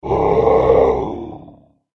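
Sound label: hydra attack voice up; gidra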